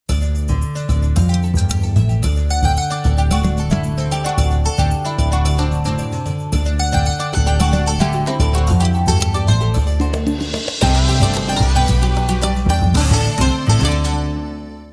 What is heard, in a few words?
Magic Dulcimer